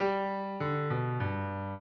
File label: trio, piano; Mozart